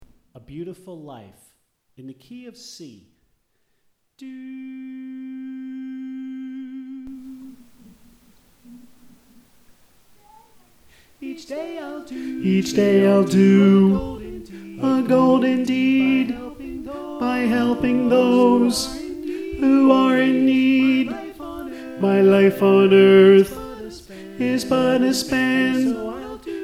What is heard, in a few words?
Tenor